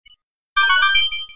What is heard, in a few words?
red coin; Super Mario 64; Mario Coin Sound Clips